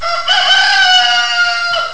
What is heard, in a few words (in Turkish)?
horoz; hayvanlar